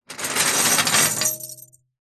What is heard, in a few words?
keys large bunch prison guard pick up from metal surface hollow